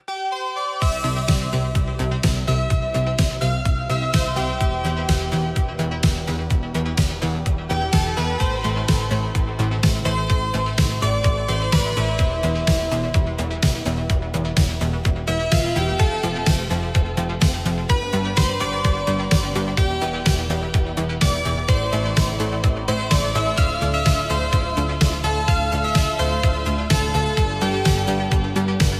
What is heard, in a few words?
Electronic Impulse